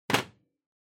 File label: Power Strip Put